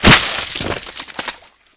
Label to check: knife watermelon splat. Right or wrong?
Right